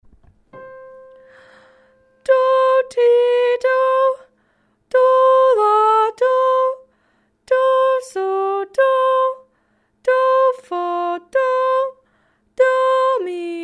Centering Song

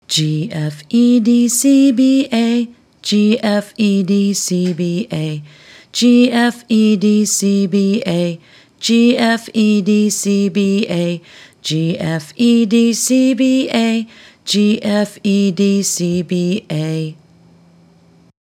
Music Alphabet Backwards

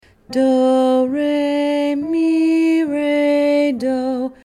Centering Song; Level 1

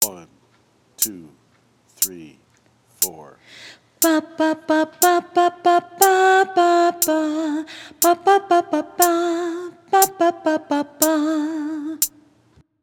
Melodic Dictation

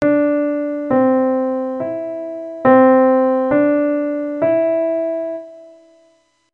Melodic Dictation Pitches Only

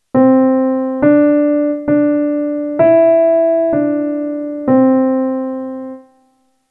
Melodic Dictation Pitches Only

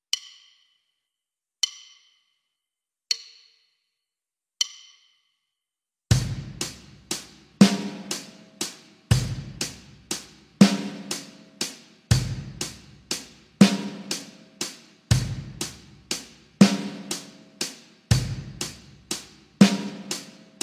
6 8 Drum Track

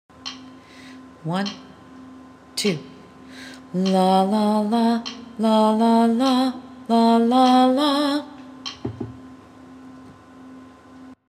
Melodic Dictation

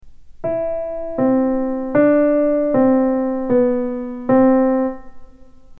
pitches only

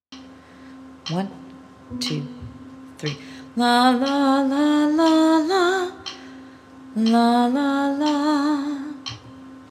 Melodic Dictation

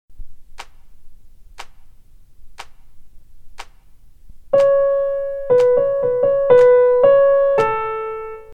Melodic Dictation

